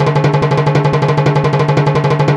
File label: timbale, Yamaha TG-500